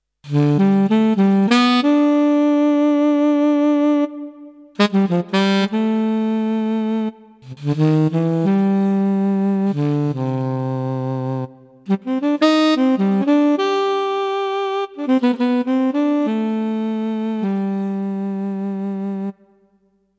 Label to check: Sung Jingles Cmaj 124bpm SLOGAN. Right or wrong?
Wrong